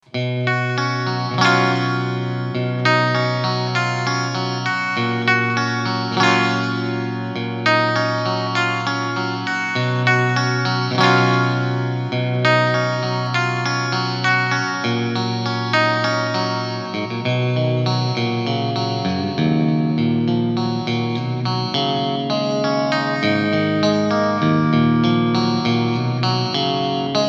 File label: arpeggio clean; Ballad; Stratocaster